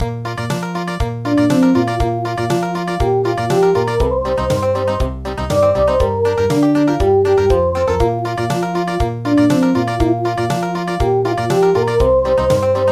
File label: Country